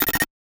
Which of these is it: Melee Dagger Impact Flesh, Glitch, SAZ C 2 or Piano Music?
Glitch